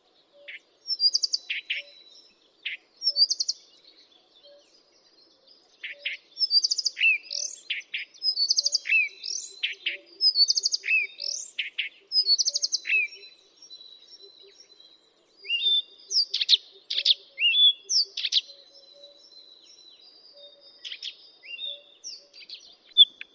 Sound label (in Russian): Sadovaya kamyshovka